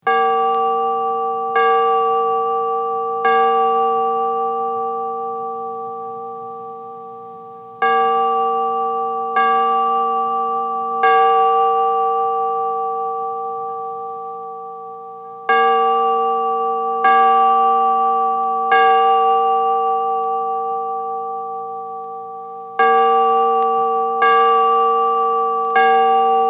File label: Church Bells Angelus; Musical